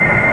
FLYBY; PC Gamer IT CD 35

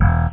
bass snap; chatterbox v1.0

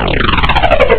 beam Out; Pararena 1.3

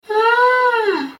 rhino; Nestle Animal Planet Land

